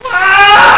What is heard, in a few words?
aaah; axiscopy